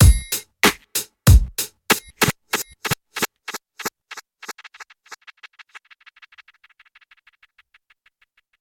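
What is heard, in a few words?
Rev Echo Break Delay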